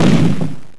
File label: Level In; MarkoSuominen; GraveYard